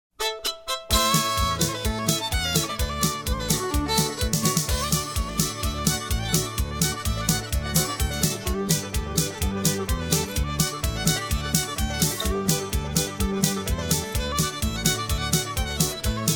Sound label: hoedown, square dance music